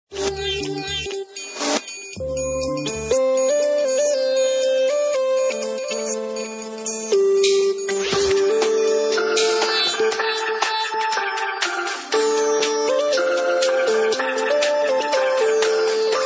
Pots N Pans

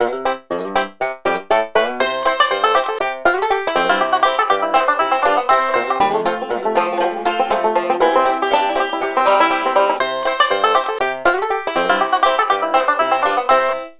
Country Farm

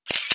camcorder capture; Samsung; GT-I7110 Pilot

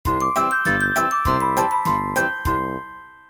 message alert; LG U370 Disney Mobile; Message Tones

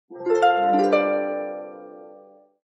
Angel Harp, notifications; Pantech Discover-P9090

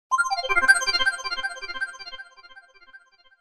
Beam, phonetones; Alcatel OT-808

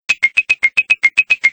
Ring Tickle; Samsung SGH-i617 BlackJack II

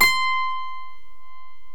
12-String C5; miniBAE source code audio

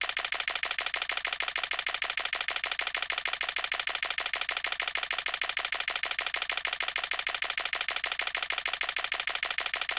multi shoot fast; ZTE Nubia Z5S Mini

